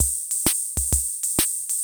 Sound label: CR78